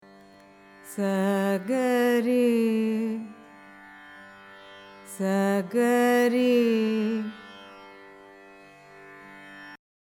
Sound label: nalinakanti, carnatic music